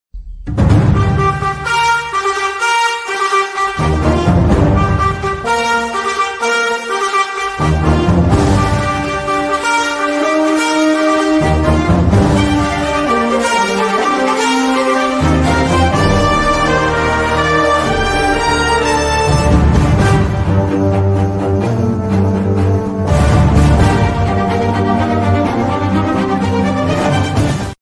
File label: bgm ringtone; mersal; vetri maaran